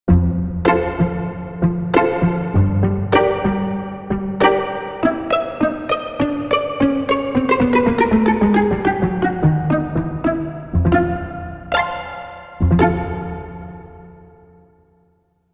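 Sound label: Pizzicato String, Korg MSC-1S; Korg MSC-1S Variety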